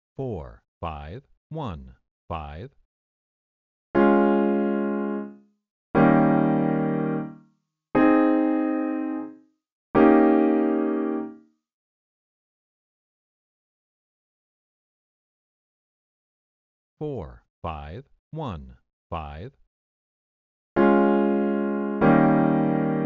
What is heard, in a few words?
I, IV, V7, progressions